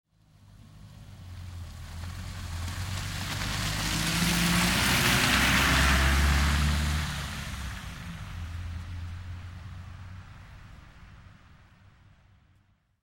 Vehicle Auto Pass By Snow Wet Road Automobile Car; Cars

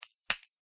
horror bone finger snap, Finger/Small Bone Break; Breaking; Objects; McKinney Sound

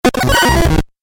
Retro Glitch; Glitch Sound Effects; sound design

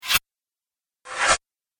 Whoosh Metal Slides; Whoosh Sound Effects; sound design

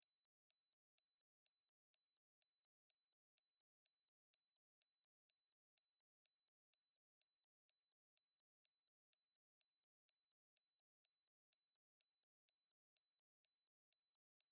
Alarm Silent Burglar Security System Beeps Loop; High Pitch; Emergency

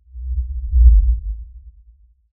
Lfe Swell Low Bass Movement; Swells; Blastwave

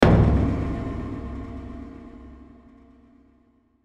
Hit Low Delay; Production Element Imaging Element Accent Transition; Bass Buttons; Blastwave

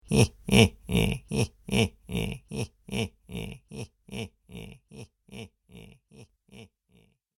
Heh Heh Heh Laugh With Delay, Evil Laugh

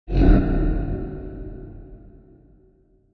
Wipe Low Long Tail, Fast whoosh, called a wipe; Fast whoosh, called a wipe, for video editing, movements, logos, flash, or animation; Wipes

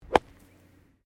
GOLF SWING WEDGE BUNKER SAND; Various Golf sounds; Sports

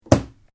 Suitcase Handle Snap; Travellers case; Snaps; Objects

